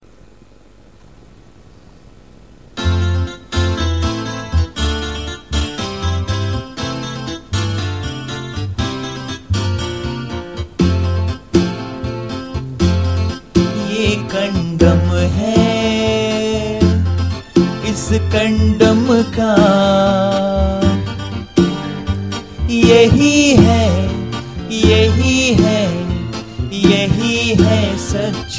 cool classical music

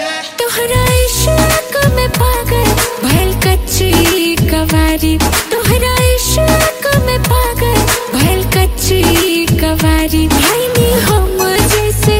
bhojpuri ringtone

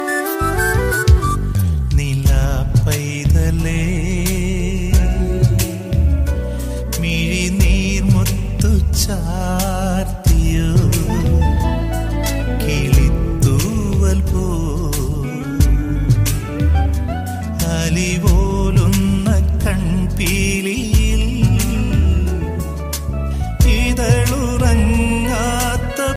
bass boosted malayalam ringtone

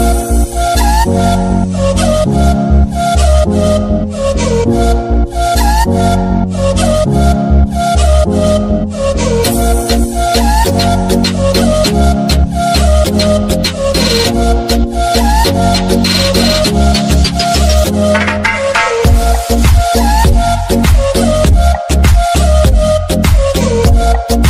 music ringtone; english ringtones

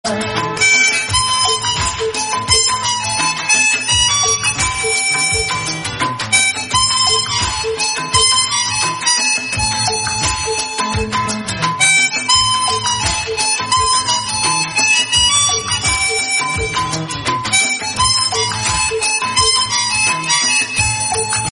instrumental ringtone

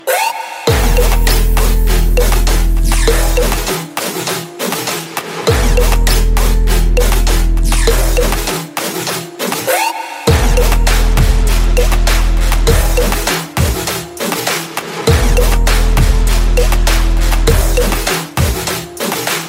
hindi music ringtone, bollywood ringtones

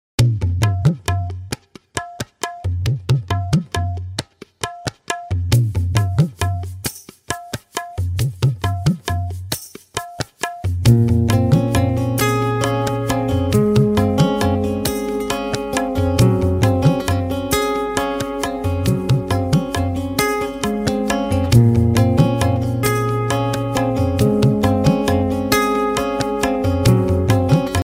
instrumental song tone

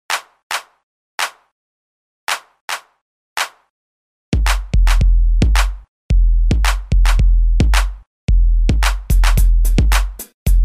beats ringtone

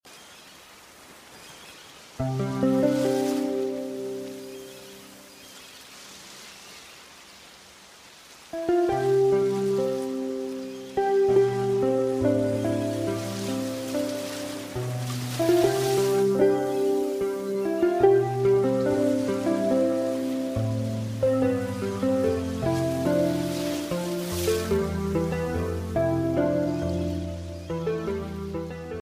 cute call tone ringtone

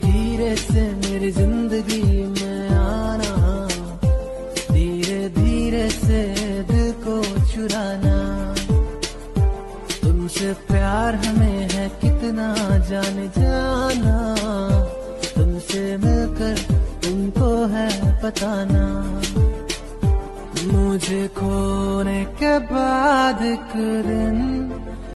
romantic music ringtone, bollywood; bollywood ringtones

